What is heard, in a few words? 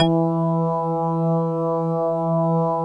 METAL B